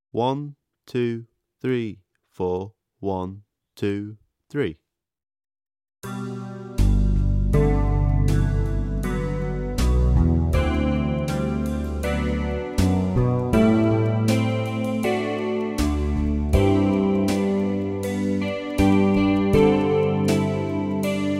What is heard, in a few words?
backing track